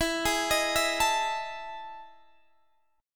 E Major 11th E down Guitar Standard; EM11 arpeggiated; JGuitar

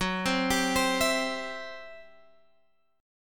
C Major F sharp

